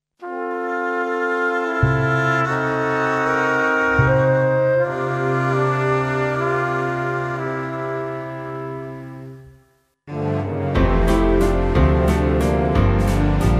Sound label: karaoke base backing track